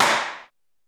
Electro SLAP; Central Processing Unit; Electro Samples Vol. 2

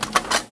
Foley

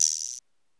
Electro 78 GUIRO; Central Processing Unit; Electro Samples Vol. 2

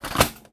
Foley

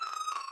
Boiling In Dust Clouds E, bsnoise

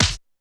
Electro Samples PUNCH; Central Processing Unit; Electro Samples Vol. 2

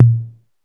808 THIGH; Central Processing Unit

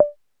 BNG, Electro Samples; Central Processing Unit; Electro Samples Vol. 2

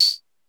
Electro Samples 78-TAMB; Central Processing Unit; Electro Samples Vol. 2

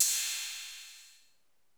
808 RIDE, Electro Samples; Central Processing Unit

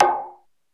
RAP NOIS, Electro Samples; Central Processing Unit

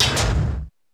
Electro DOOR; Central Processing Unit; Electro Samples Vol. 2

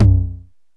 Electro Samples 909-T; Central Processing Unit; Electro Samples Vol. 2

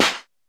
Electro Samples SMASH; Central Processing Unit; Electro Samples Vol. 2

